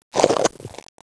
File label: chomping